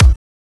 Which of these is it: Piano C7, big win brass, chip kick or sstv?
chip kick